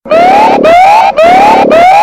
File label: Alarm Clock; Coolpad